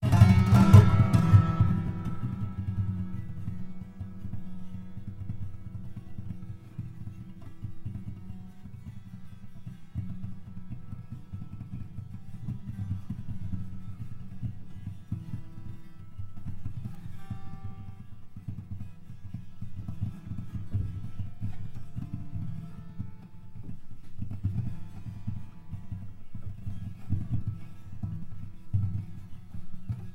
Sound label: piano choral